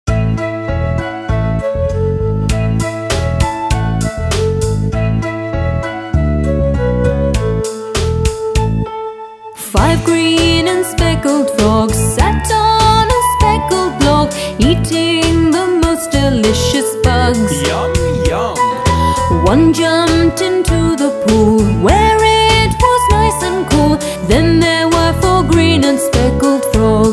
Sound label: Five green and speckled frogs